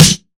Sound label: clean snare one shot d key; snare drum samples